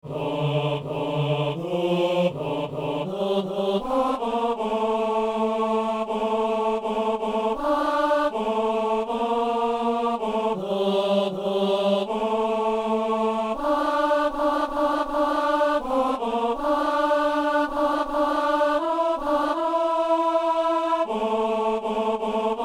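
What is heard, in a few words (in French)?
ténor; messe inaugurale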